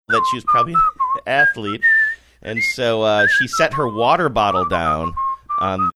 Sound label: whistling while talking